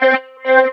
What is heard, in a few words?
talk box choir